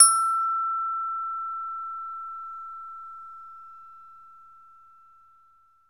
BL LONG E5, Advanced Orchestra; Best Service

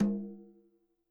CL h, KILLER CONGA

